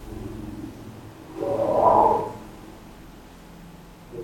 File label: 2nd floor - growl sound; growl sound at 5 seconds; VOICE ISOLATED; MALE VOICE